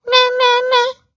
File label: Stand leg poke